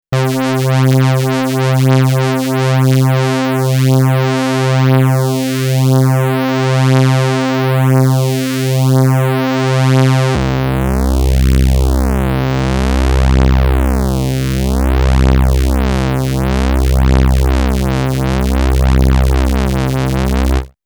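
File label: svf notch lfo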